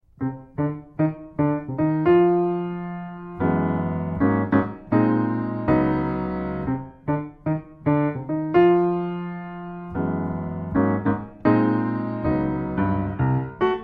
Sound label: solo piano